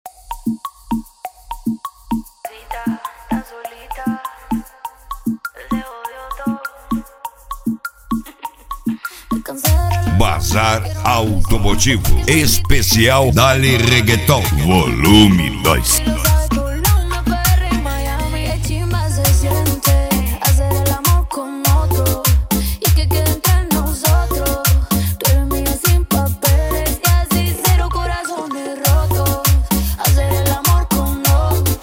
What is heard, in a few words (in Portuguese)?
dale reggaeton; cd bazar automotivo